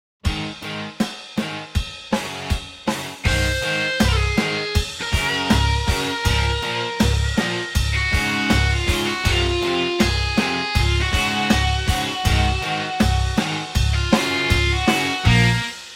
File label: rock